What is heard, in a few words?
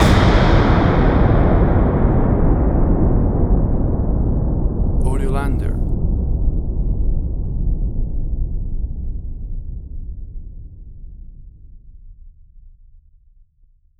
Big Mechanical Hit